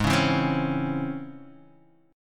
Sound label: Db Minor Major 7th Ab